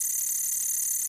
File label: count Up Loop; netent